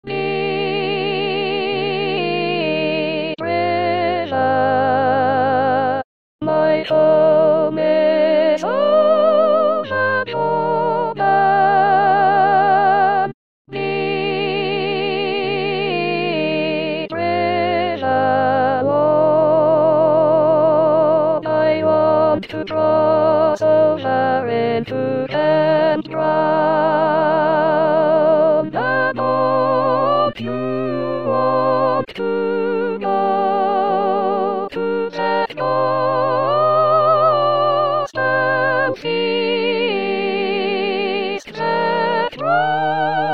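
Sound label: soprano; Deep river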